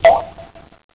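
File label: water drop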